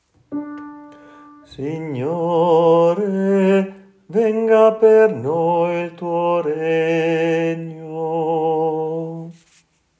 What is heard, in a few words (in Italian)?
acclamazione finale Tenori; Signore Vieni; coro diocesano